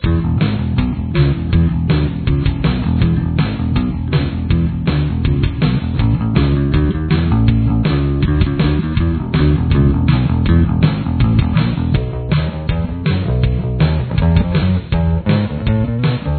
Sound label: bass